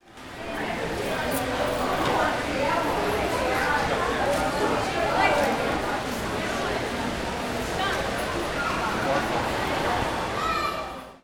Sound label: mall, ambience